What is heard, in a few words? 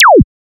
impulse response